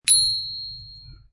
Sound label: kaching